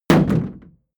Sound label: shield sheet impact dent